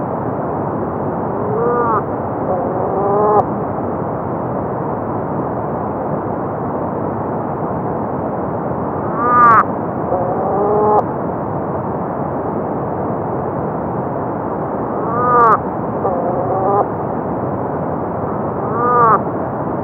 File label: psyllid m f